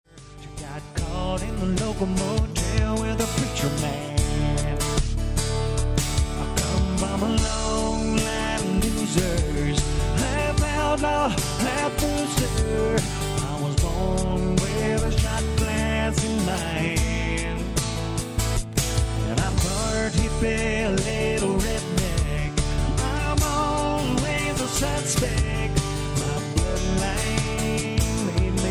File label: LIVE, Acoustic Guitar